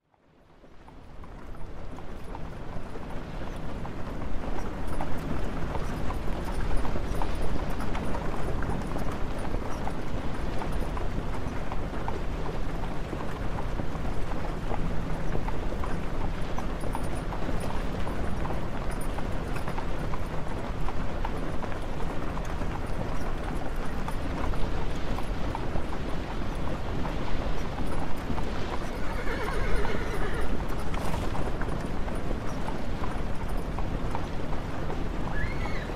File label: horse and carriage sfx